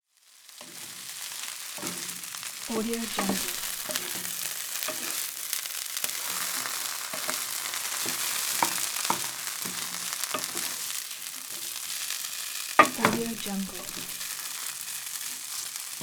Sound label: frying pan